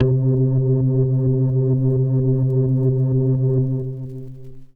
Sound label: Leslie Vinyl Synths C2; Kawaii